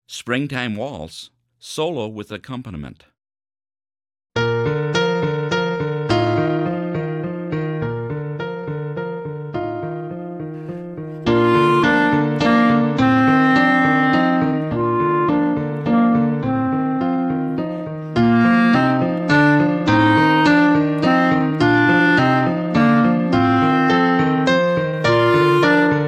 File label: springtime waltz slower solo with accompaniment